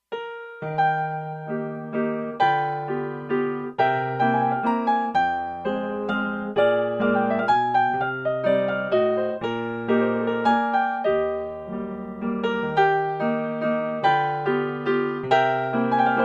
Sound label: waltz